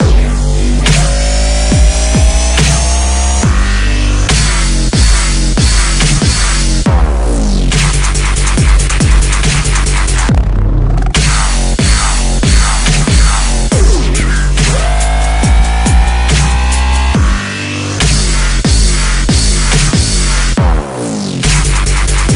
wubwubwub

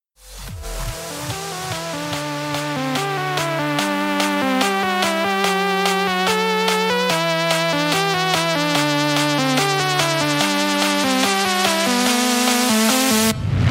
buildup; TF2Maps; chillskies; wubwubwub

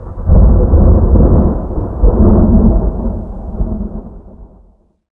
thunder; Minecraft; weather; ambient

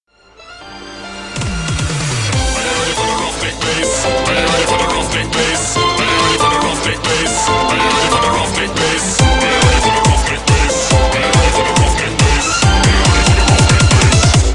buildup, wub wub wub; chillskies

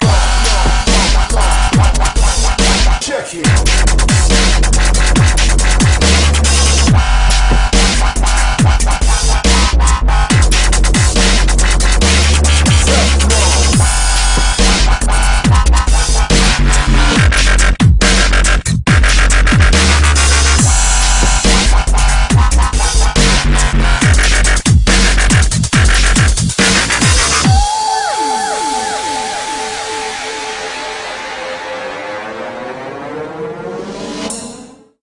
Drop, wub wub wub; TF2Maps; chillskies

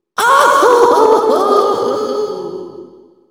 ohohohohoo; luigi

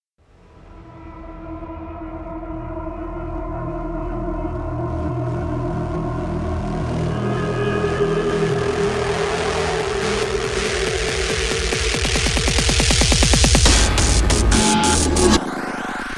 buildup; TF2Maps; chillskies; wubwubwub

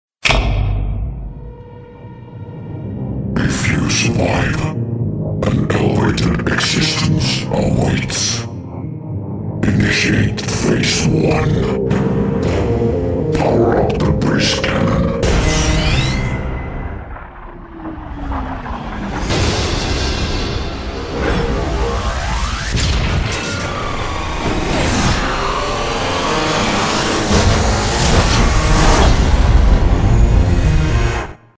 powerup, basscannon; chillskies

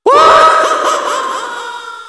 wahahahaha; mario

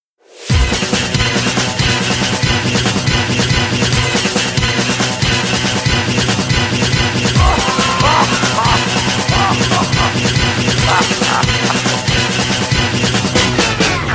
buildup; TF2Maps; chillskies; wubwubwub

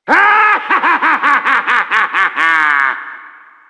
evil laugh; TF2Maps

